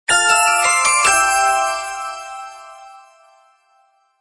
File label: JINGLE bell; Drago Noka; ps4 switch windows gamerip 2022